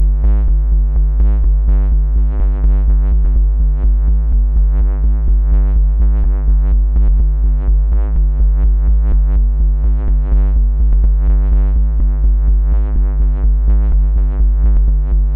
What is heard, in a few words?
Tech House Bass Rhythm Melody Sustained, Loudest frequency 58 Hz; instruments; loops